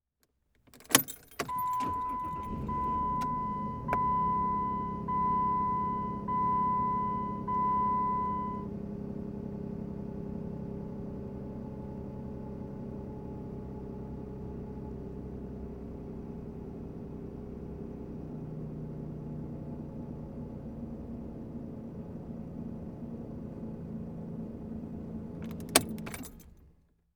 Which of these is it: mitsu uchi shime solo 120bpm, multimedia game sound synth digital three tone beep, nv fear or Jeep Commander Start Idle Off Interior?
Jeep Commander Start Idle Off Interior